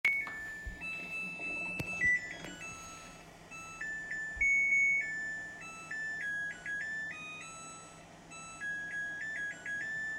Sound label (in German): geraeusch